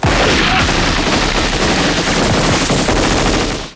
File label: soft